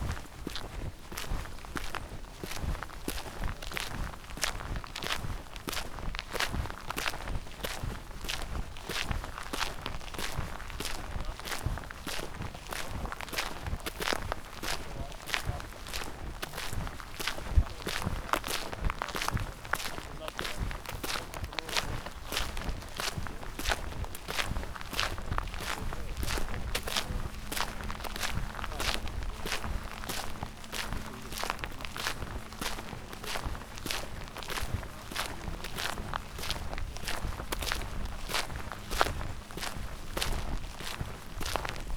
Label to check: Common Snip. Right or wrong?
Wrong